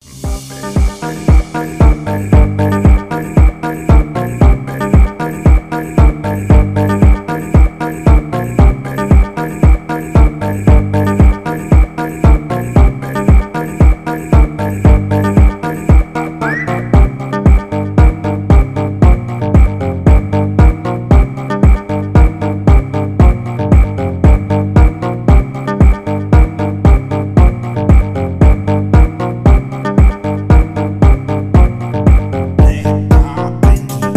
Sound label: FUNK; Фонк; Тик Ток